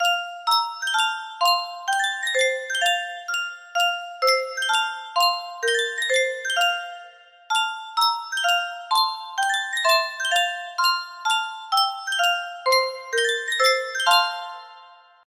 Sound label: yunsheng music box, national anthem, music box melody; the philippines